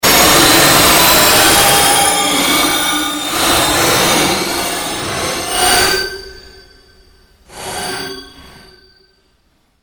FLUCTUATION FADE, Sound effects created by manipulating other sounds; Rise And Dive; Rises and Dives